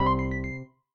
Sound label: combo power